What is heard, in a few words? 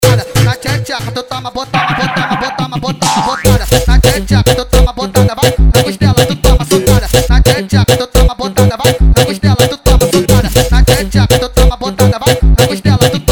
funk